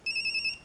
nokia 3210 ringtone

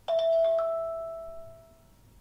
ringtone; bulletin; apple iphone 5

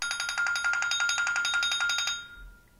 ringtone; apple iphone 5; presto

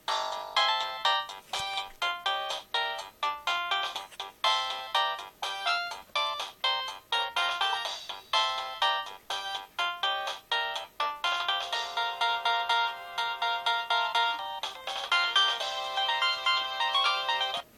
samsung sghe700 ringtone

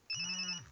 nokia 5510 ringtones; bee

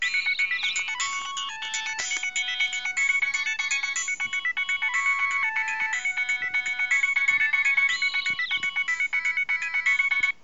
nokia3510i airglide ringtone

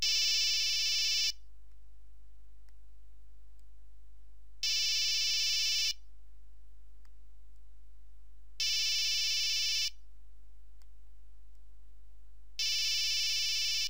nokia 3310 low ringtone

